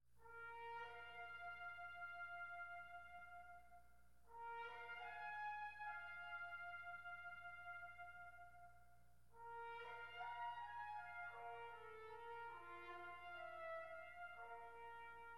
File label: Concerto for Trumpet, Brass Ensemble, and Percussion - Adagio - Allegro Moderat; Allegro Moderato